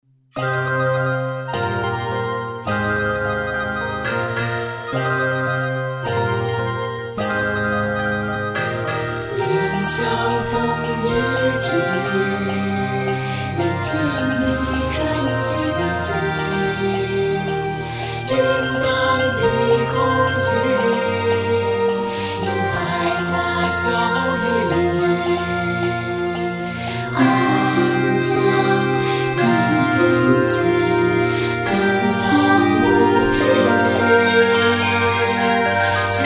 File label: chorus